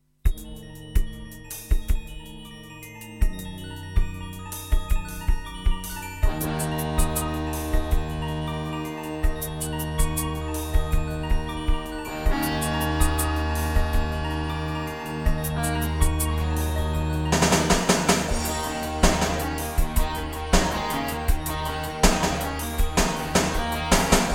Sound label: EMU SoundEngine; Battle Isle II